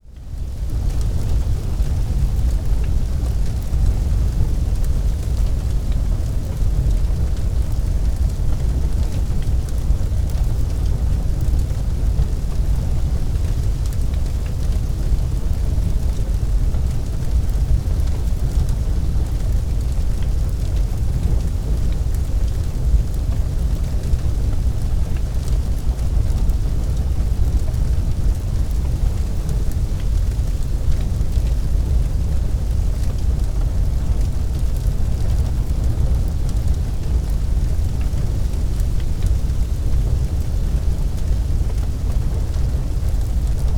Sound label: Fire Roar Blaze Exterior Structure; Fire and Explosions